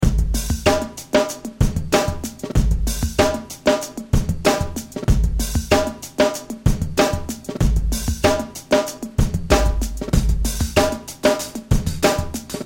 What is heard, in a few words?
hiphop